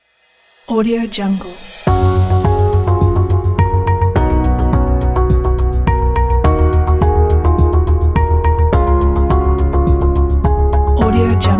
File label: intro corporate